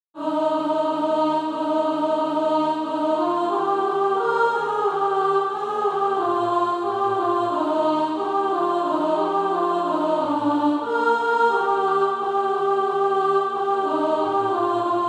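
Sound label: alto, Practise Music; Crystal Songs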